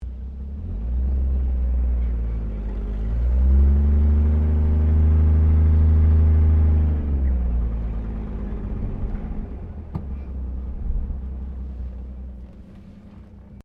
CAR HOLDEN FE INTERIOR MANUEAVERING SLOW, Classic Australian cars; Holden Fe 1957; Vehicles